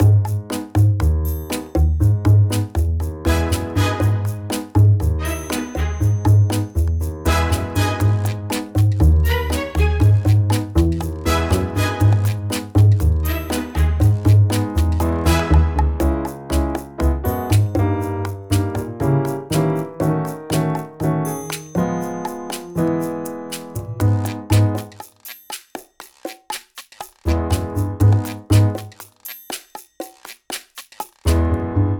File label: Groovy Beat Latin